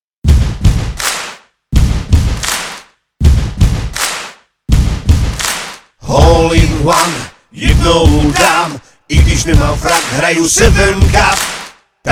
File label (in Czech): hymna